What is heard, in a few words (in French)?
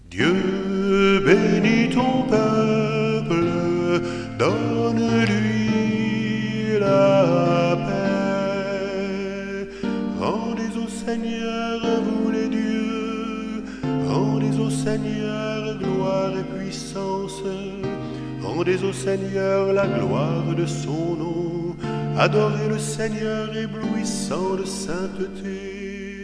Psaume 28 Dieu benis ton peuple